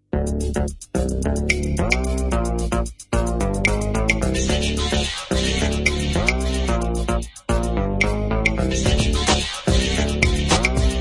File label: breakdance instrumix